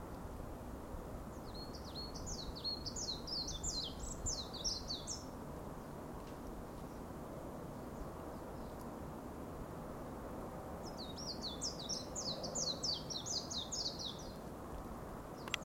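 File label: Japanese white-eye; mejiro; akiu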